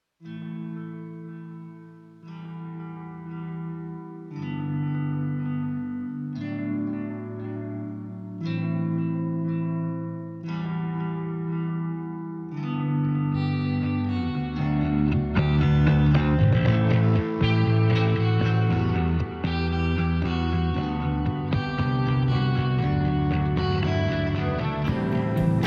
Instrumental; OF FATHERS AND SONS – Soundtrack

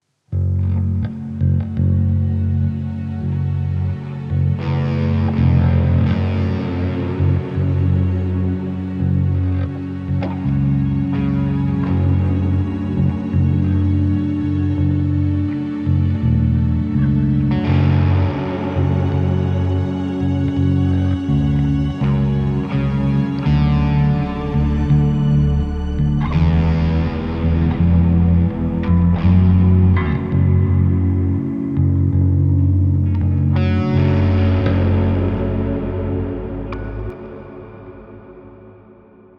Tragic Guitars Pad; OF FATHERS AND SONS – Soundtrack

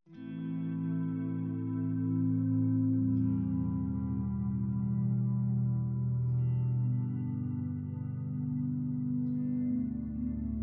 Stardust Guitar; OF FATHERS AND SONS – Soundtrack

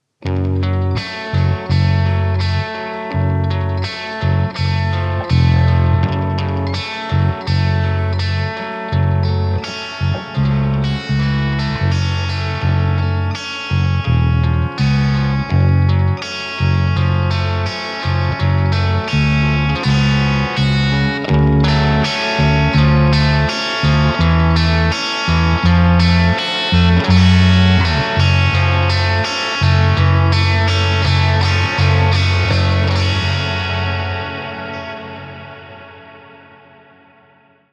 Tragic Guitars Bass; OF FATHERS AND SONS – Soundtrack

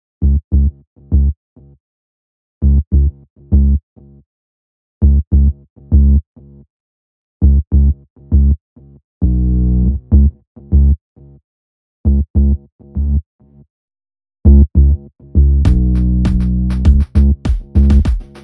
bass tec electro; OF FATHERS AND SONS – Soundtrack

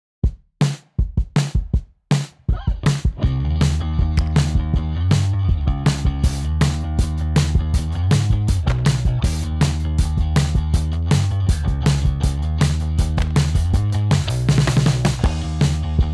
Punk Bass; OF FATHERS AND SONS – Soundtrack

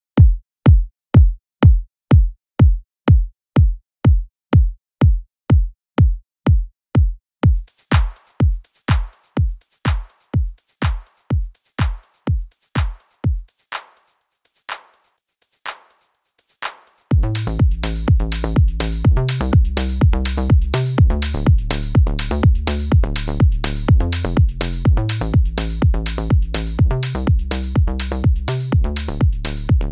Electro

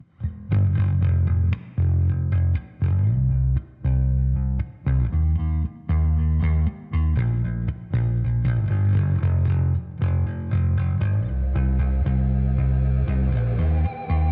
Bass Organ Drums; OF FATHERS AND SONS – Soundtrack

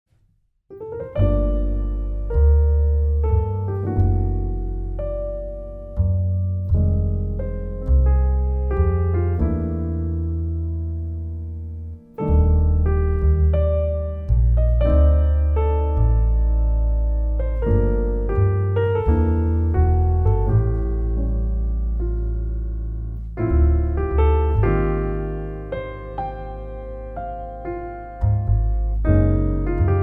jazz

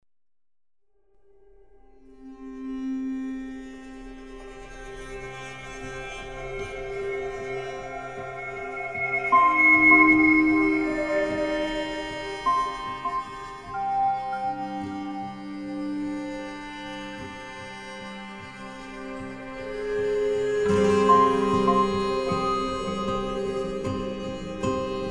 Ethno

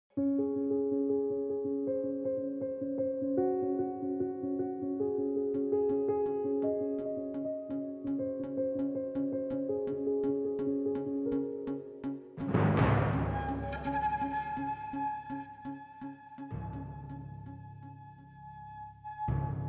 acoustic Pop emo Comedy

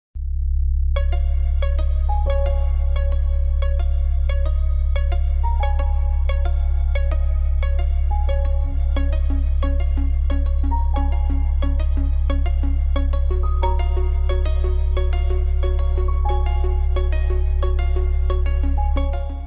Electro Pop

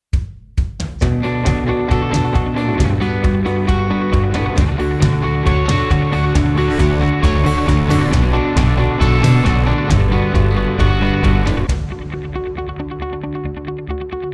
Contemp Rock RomCom

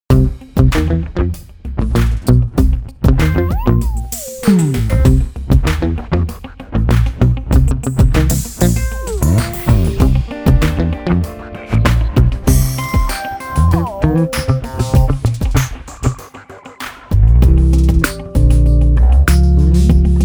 cool Comedy

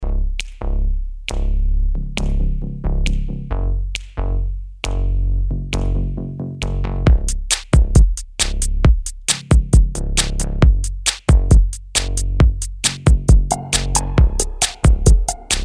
electro Pop Comedy

